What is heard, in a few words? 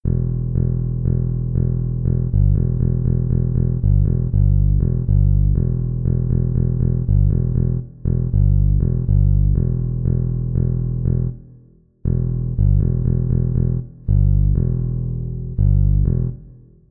Funkey Bass only